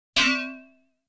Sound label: The sound of a tonkachi hitting an enemy's shield & the sound of a Cookman frying pan; dondokodon